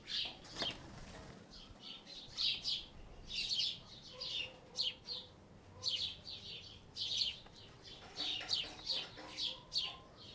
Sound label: birds in a yard, ambience; NS Studios - ultra sound collection